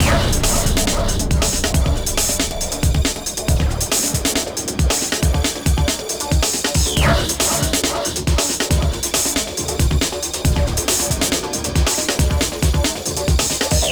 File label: Hi Groove; Unreal II The Awakening; Acheron